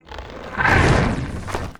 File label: WAR ATTACK; GRAVELAPIS; Mob